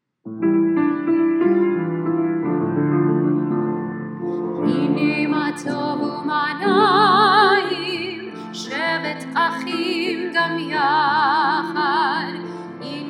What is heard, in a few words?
Hinei Mah Tov folk